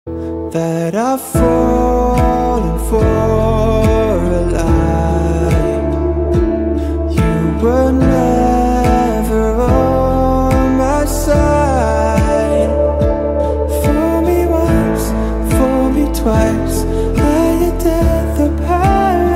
male english ringtone

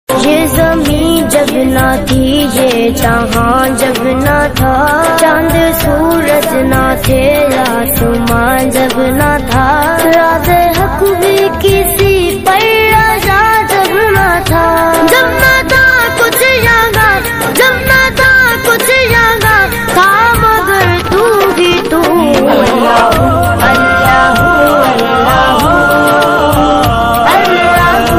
islamic ringtones

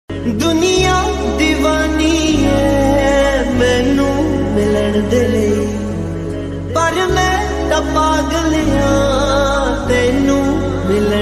lofi Punjabi ringtone

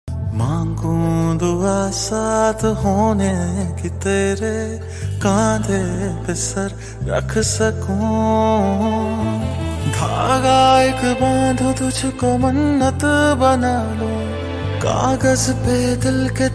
Title ringtone male version, hindi ringtone

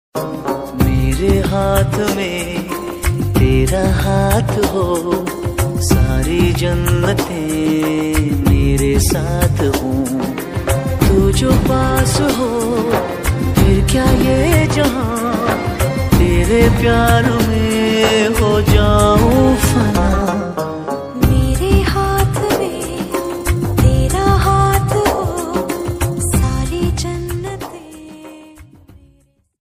male hindi ringtone

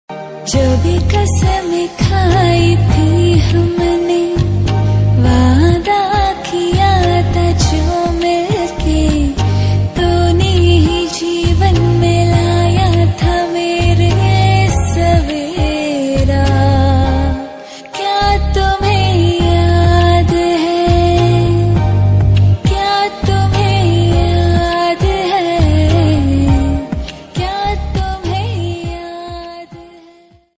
female ringtone

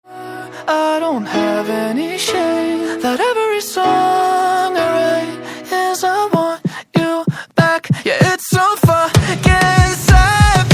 Piano english ringtone

